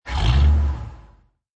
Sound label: Rev Short, cars